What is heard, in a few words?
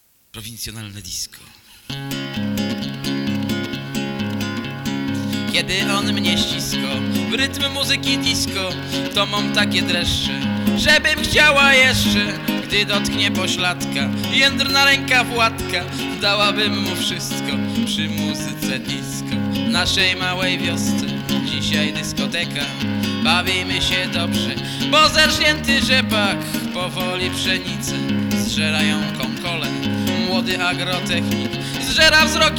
disco